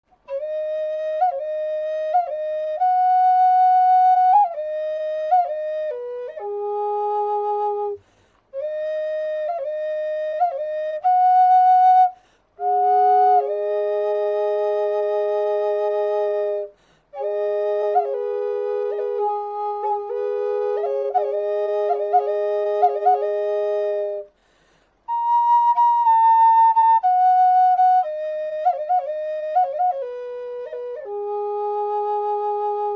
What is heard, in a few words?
Drone Medium Ab, Native American Drone Flutes; Scales; Full Spectrum Scale